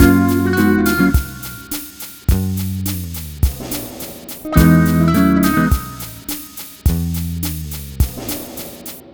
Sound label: Multi Guitar Bossa Mix-F#, 105; Zero-G Kocktail Kollection; Construction Kitz (Lounge)